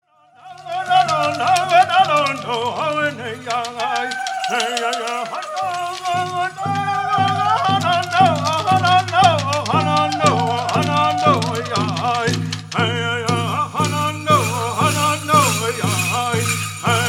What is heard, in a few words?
Apache Crown Dance Song